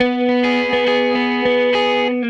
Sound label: Gretsch Guitar Loop